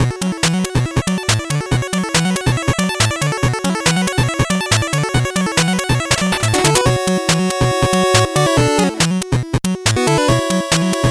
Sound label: 8bit bgm fast